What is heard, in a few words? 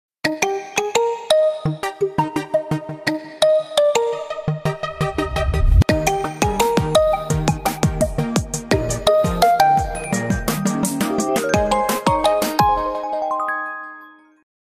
affability electronic